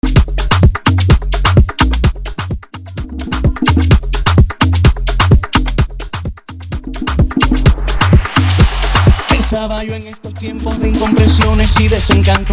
afro house 128bpm

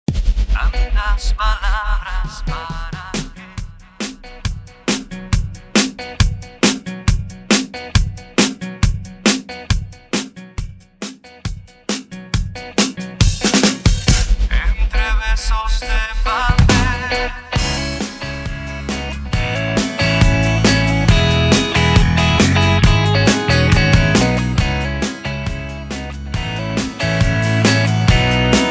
Intro Outro 68 BPM

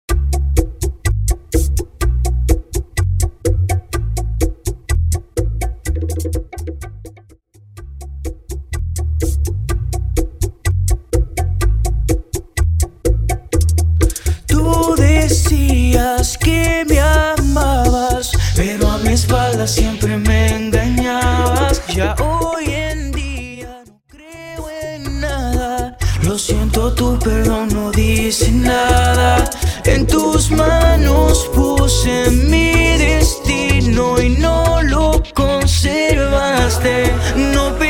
bachata club mix 125 BPM

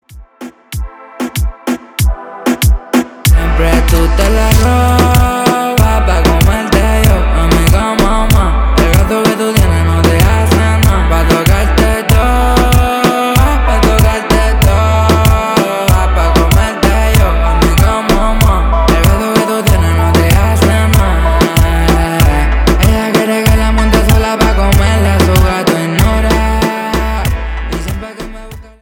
Intro Verso 95 BPM

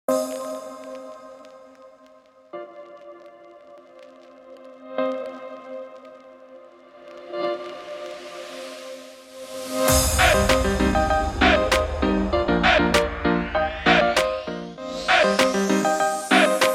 Open Show 98 BPM

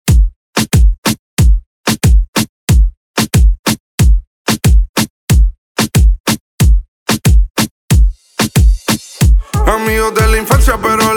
remix bpm 92

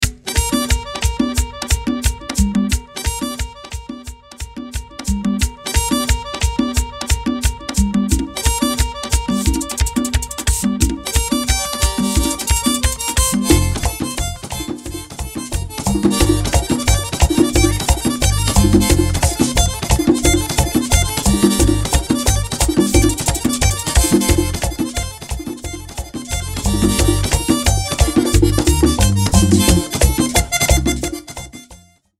Club Rework 178BPM

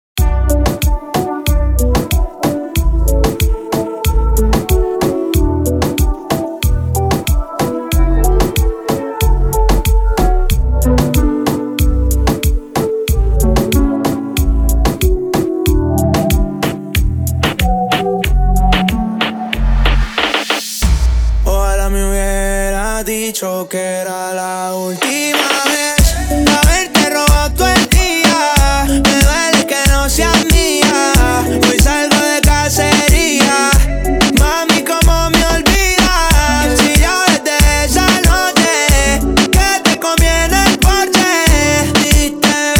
Intro Outro 93 BPM

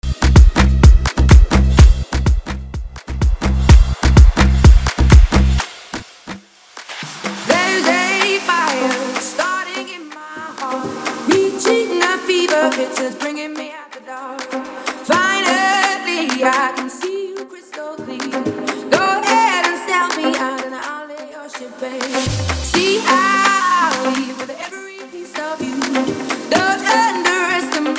afro house 128 bpm

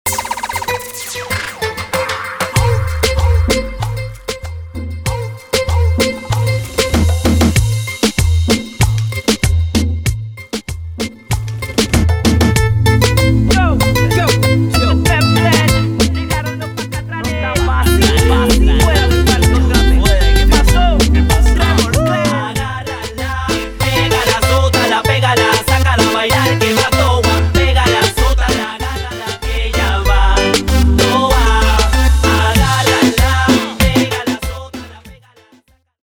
96 BPM Intro bass; DJ Mixsao; Latin music remixes and edits

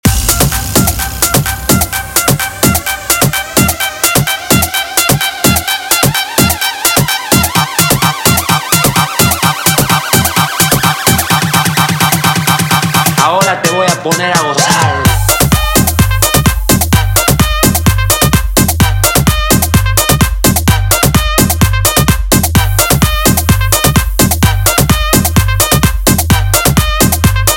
tech remix 128bpm; Tech Bootleg Remix

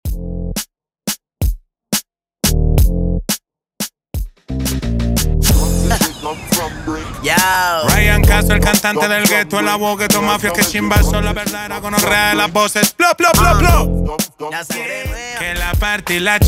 Intro Outro 88 BPM

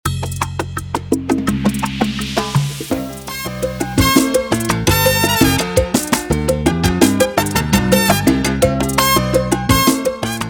84 bpm

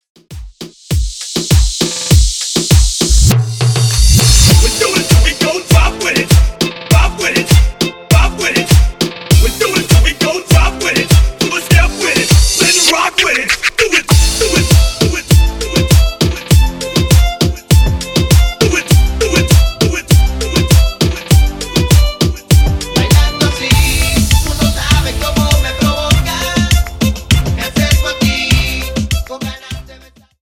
Remix Intro Outro 100 Bpm

